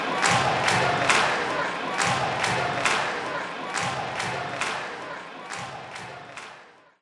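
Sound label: Ambience Darts Crowd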